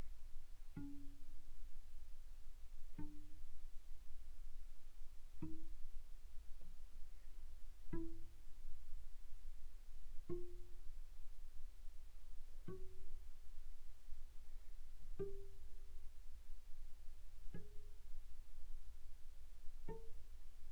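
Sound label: Cello pizz pp sul D D4 D5 stereo, pizzicato; Strings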